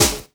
SD, Dance Drums

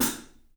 3D Drums, TIGHT ZILDJ; 3D Sound

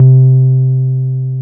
303 MUTED C3, ROLAND TB303; Big Fish Audio; First Call Vintage Keyboards

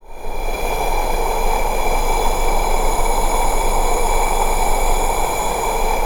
NOISEBED, VOCAL WIND; ILIO; Vocal Planet; Jazz & FX

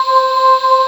BREATH BEL C4, VO&BELLS; AKAI S6000 CD-ROM - Volume 1; SAMPLE WAVE MIXING 1

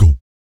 VOC PERC, Vocal Planet Jazz FX BASS PERC

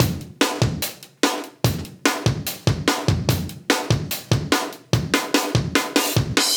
146 live breaks

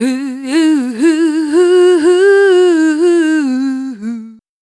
VOC F, Dance Vocals, FEMALE

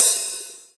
OPEN HH; USB Soundscan vol.51; AKAI

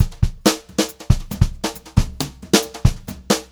136 VARI, Brushed Drum Loops

